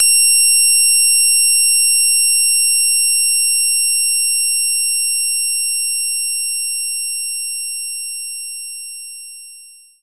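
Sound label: Guitar Harmonics C6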